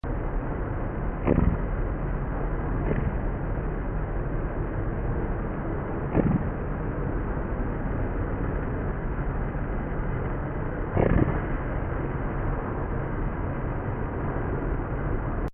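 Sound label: North Atlantic Right Whale gun shots; baleen whales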